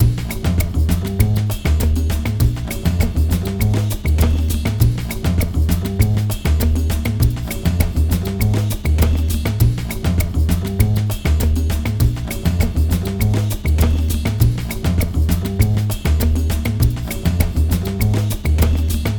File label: loop funky